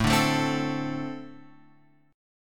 A-Minor Double Flat 5th A; Ambb5 Chord {5 5 7 5 X 5} strummed

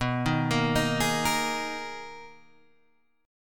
B Major 13th B, Guitar, Standard; BM13; arpeggiated; JGuitar

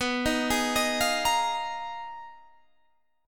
G sharp Minor 6th Add 9th B, guitar chord

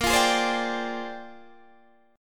A sharp 13th, Guitar DADGAD

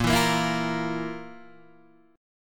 F Major 7th Suspended 4th Bb

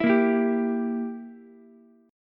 E Diminished E, Ukulele; Edim strummed; JGuitar

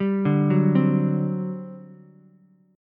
G Major 7th Suspended 4th, G, down, Ukulele; GM7sus4 arpeggiated; JGuitar

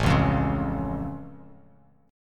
A Augmented A down; strummed; JGuitar

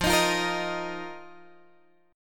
F 7th Suspended 4th F down Guitar Open G

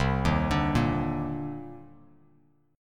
C Minor 9th, Bass Standard; Cm9 arpeggiated; JGuitar

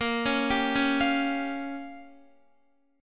A sharp Minor 6th, Guitar; A#m6; arpeggiated; x,13,11,12,13,12

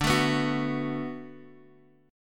D 6th D down Guitar Drop D, chord

